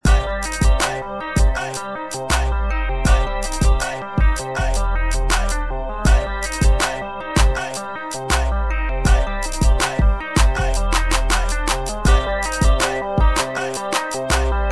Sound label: Beat